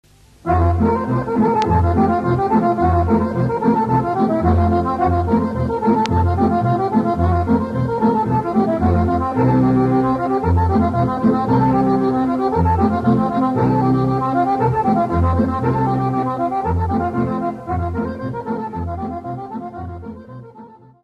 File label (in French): Bulgarie; Trite Pati